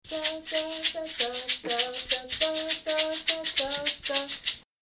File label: hosho, marimba; babamudiki; tsaba tsaba 1